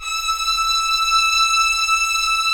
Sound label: STR VIO; AKAI S-Series CD-ROM Sound Library VOL-2